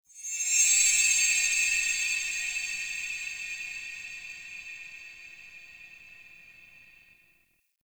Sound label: High Shing; Warframe; Ambience; Lore